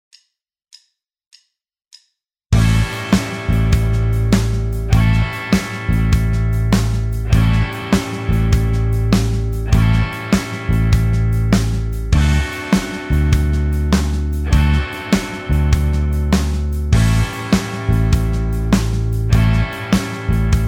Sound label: Basic 12-Bar Blues, guitar; guitarist presents blues; The Basics